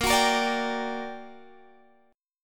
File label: G Minor Major 7th, Bb, guitar chord